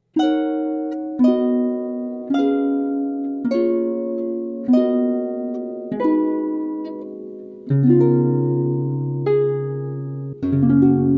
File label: Harp